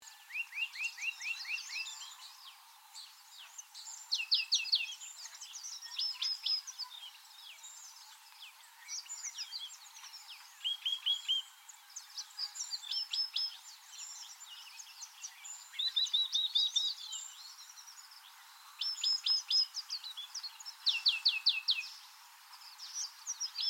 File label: L rubricata